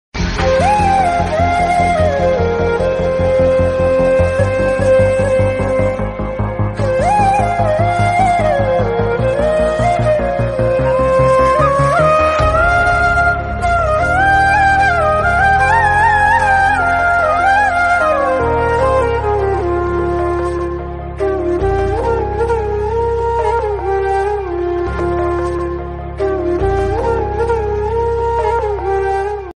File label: flute ringtone, emotional ringtone; hridayam movie